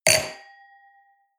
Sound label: wine glass place table hard, gastronomie; berufe; soundpool